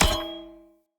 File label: Cleaver Throw Catch, Swords; Weapons; Player; Bromius Cleaver